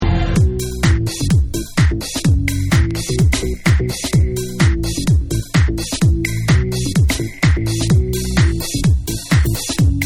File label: Thunder Clap Dub